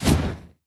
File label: Wrath Flame; Power Veznan